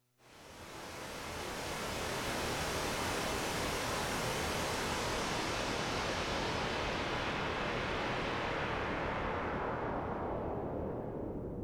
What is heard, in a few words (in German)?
Rauschgenerator und WASP